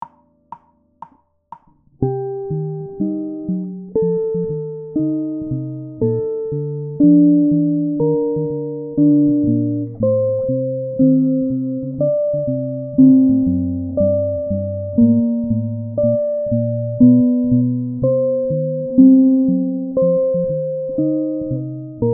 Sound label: chord